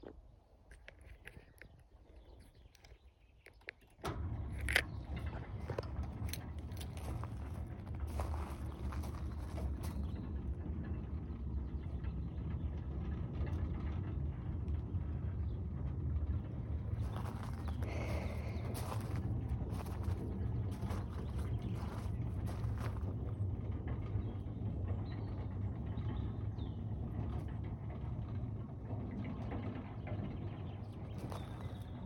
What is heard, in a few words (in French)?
portail coulissant nice ne se ferme completement